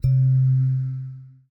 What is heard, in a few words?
mouseover; gamble; DoubleTigersWD; wazdan